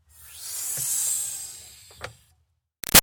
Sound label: Car Highlander Trunk Air Hiss; 2005 Toyota Highlander V6; Cars; Vehicles